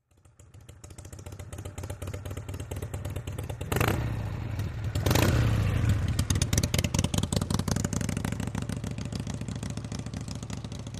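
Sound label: Motorcycle Harley Idle; harley-davidson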